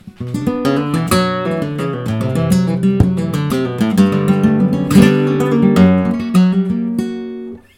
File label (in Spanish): falseta